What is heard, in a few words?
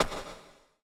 Footstep Retro-Game Stone-Reverb